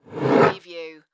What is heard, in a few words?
Bottle Wine Slide; sound-effects-bible